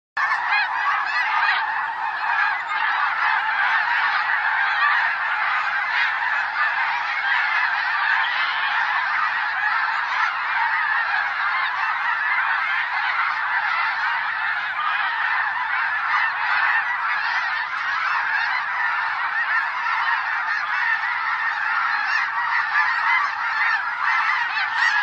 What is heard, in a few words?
Snow Goose; Birds